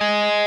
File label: solo